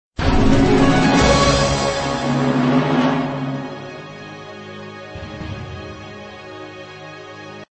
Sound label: win